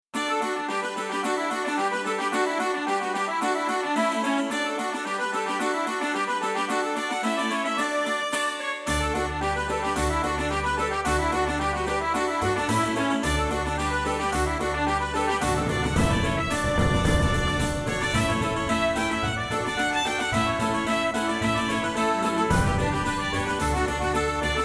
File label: Hornpipe